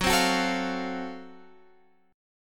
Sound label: F sharp Minor Major 7th Flat 5th F sharp down